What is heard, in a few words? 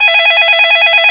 ied